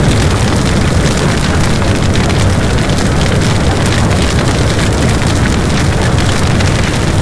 tank fire

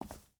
footstep hide concrete walk; Rust

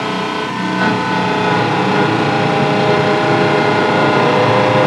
turret, tanks; World of Tanks

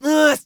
hit; Escape from Tarkov; scav2

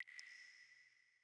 water drip light; Rust

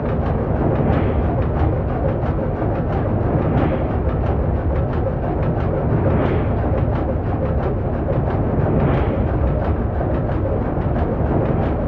ship engines; World Of Warships

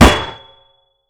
Projectile Sounds; Red Orchestra Ostfront 41-45

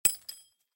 shells 20mm ground; guns; tanks; War Thunder

